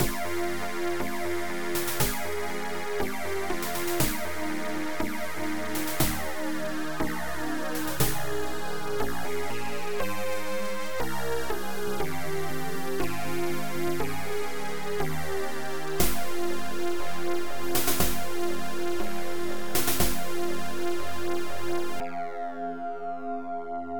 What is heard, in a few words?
lose